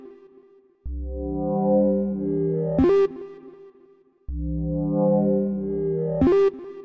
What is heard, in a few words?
Jump